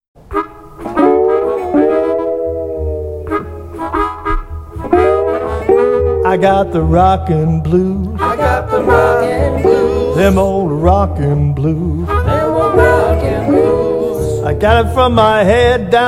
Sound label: Rockin Blues